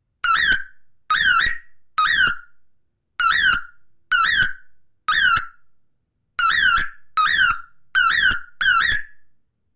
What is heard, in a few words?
Alarm Synthetic; hi-tech-various